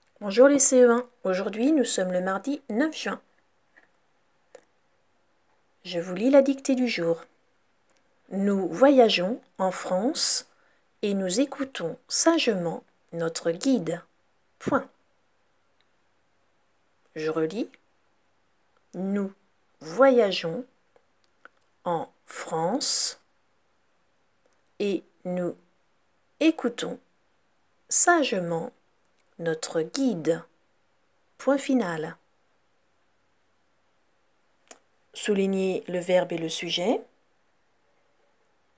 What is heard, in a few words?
Vocal